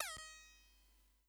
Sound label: laser; arilou